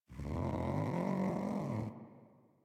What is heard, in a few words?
Feral Vocal Idle; Warframe; Catbrow